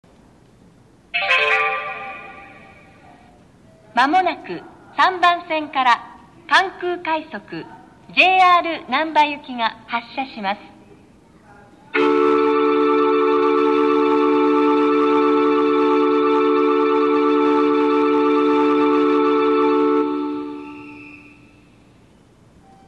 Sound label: kansai airport track, eki; kankurapid; nishinihon